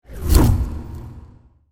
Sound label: torch by oneshot